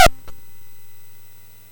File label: MOS6581R3, GAMES; Spikes Peak